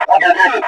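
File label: daed search; daedalus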